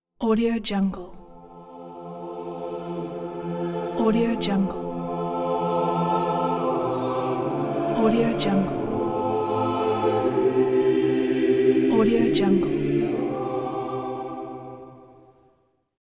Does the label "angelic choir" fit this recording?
Yes